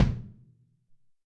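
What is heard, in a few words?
Amb Kick, drums; Alesis SR-18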